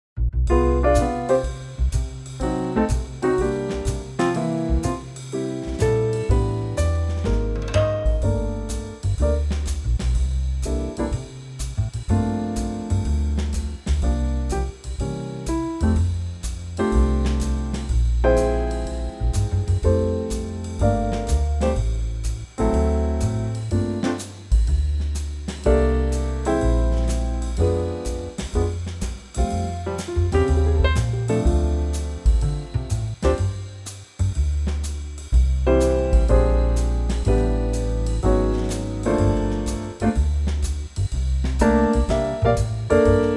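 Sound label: F trio, Backing Tracks for Male Vocals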